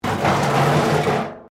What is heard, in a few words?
METAL BIN SCRAPE CONCRETE; Materials